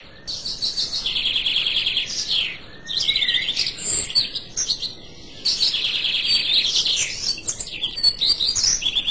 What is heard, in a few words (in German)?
zwitscher; deathrun countryside